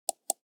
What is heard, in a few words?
Sticky Mouse Click; Dauzkobza; Creator Kit